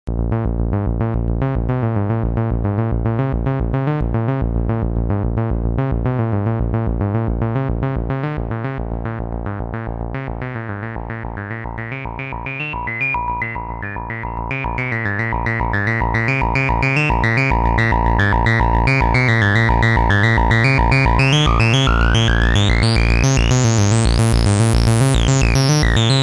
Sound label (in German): synthwerk sequenz